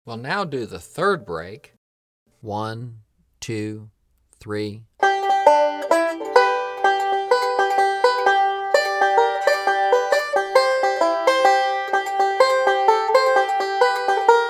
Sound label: Hammer Break 2 @ 64 Bpm